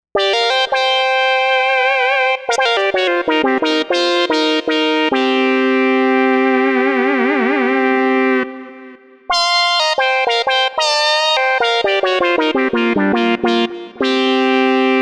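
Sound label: Lead Classic Five, synth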